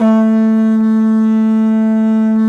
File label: A2 HORN L, Didgeridoo and Other Primitive Instruments; Big Fish Audio; DIDGEHORN1